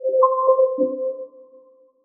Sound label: glitch C maj; bespoke - Floral v.1